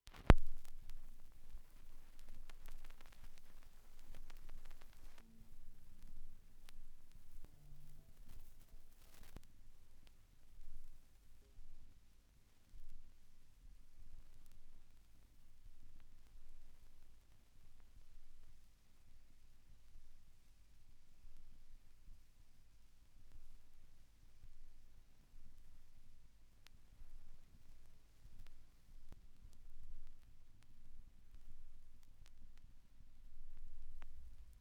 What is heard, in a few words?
Surface Noise after Plays